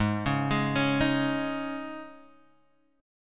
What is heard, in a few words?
G sharp Suspended 4th G sharp, Guitar Half Step Down